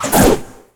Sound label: scythe swing